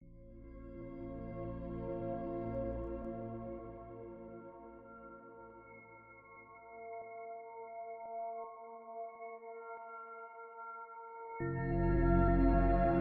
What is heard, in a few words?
Soothing Spa Music